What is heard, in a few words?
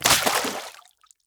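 water run, footstep; fst water; skyrim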